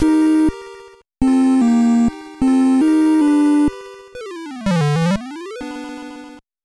Quest completed; King's Quest V; NES

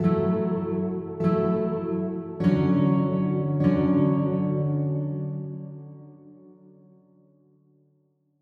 Guitar Temp Layer

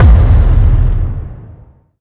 Footstep

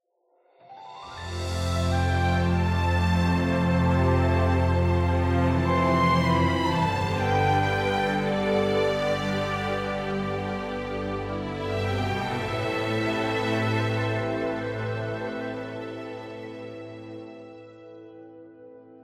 Puzzle Solve Layer; Perspective Puzzle

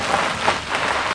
Grass skid

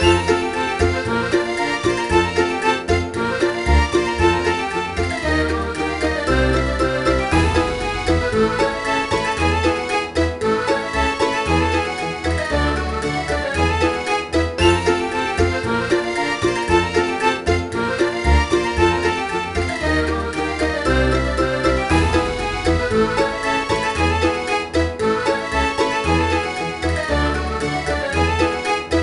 Music Bomb Ball; Six Flags Fun Park 2008 nds gamerip

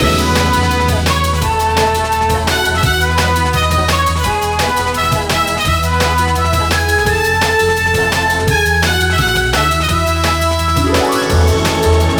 Music Race Winning

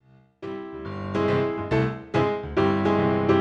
cutscene piano intro

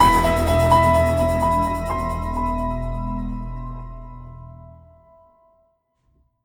Stinger Stalk; Level 02

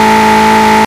Toyota Supra-A80 High, car; gamerip; Car Simulator 2; android

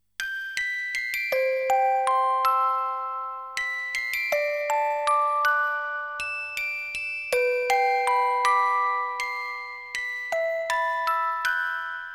Orgel Ver.; yume miru koi no musubikata

